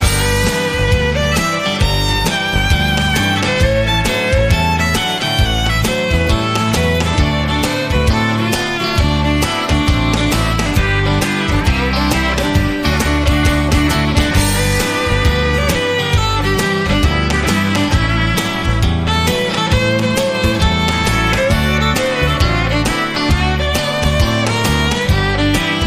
Country Boogie

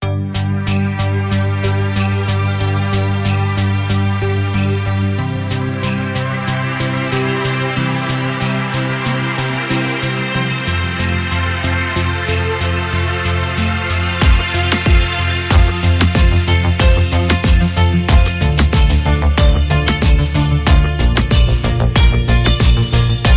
Reggaeton Rhythm

